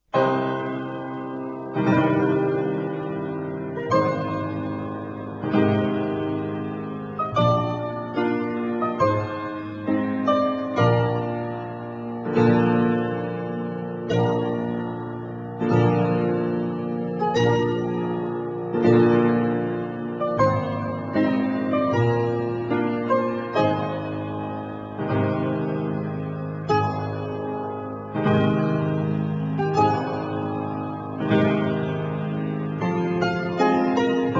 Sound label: Piano Solo